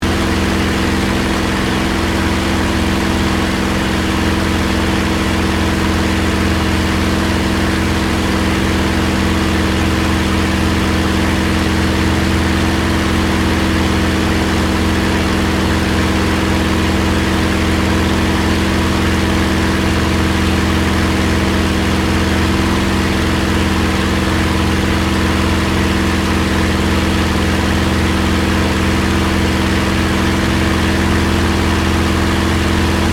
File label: AIRCRAFT SUPERMARINE SPITFIRE MK-XVI FLYING INTERIOR STEREO; Airplane Sound Effects